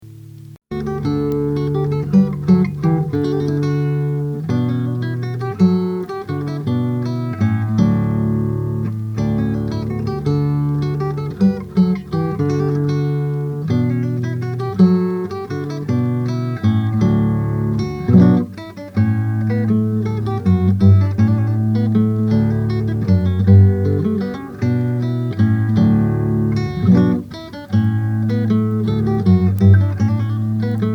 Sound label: Lute Piece